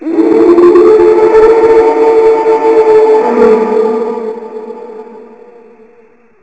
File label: Scream slower